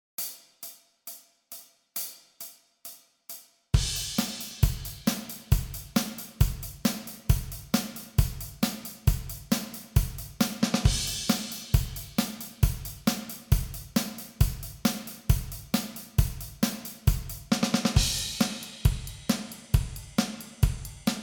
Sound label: 135 bpm, practice tracks